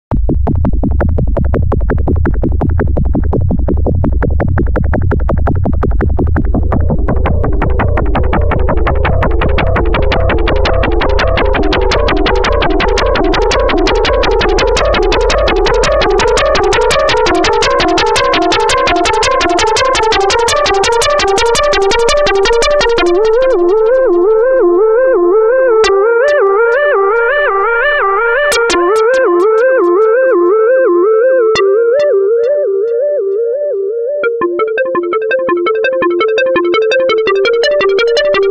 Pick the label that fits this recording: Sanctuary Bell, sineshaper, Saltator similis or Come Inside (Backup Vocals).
sineshaper